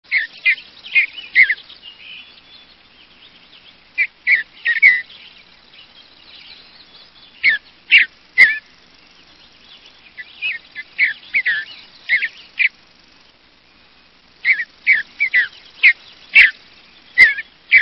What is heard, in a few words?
Mountain Bluebird